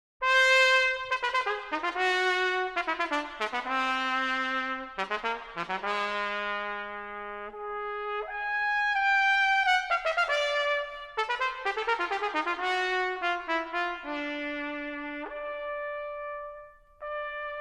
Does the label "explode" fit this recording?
No